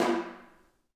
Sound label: Kenyan Drum, Africa hand drum; Roland LCDP14 Africa VOL-2; Af.Hand Drm1